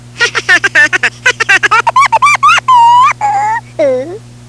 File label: laugh; 2-XL Laugh #3